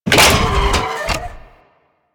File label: Thumper Bot Leg Damaged E; Warframe; Grineer